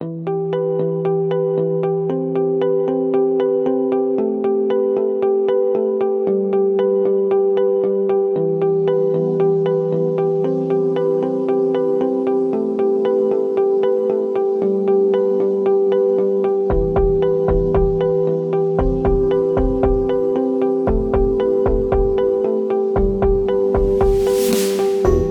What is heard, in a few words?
Dark Forest 115BPM